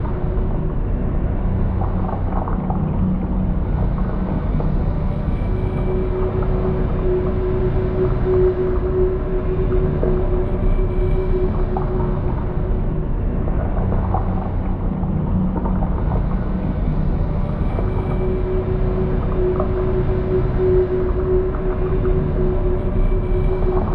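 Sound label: necroid ambience colony ship hum; Stellaris; necroids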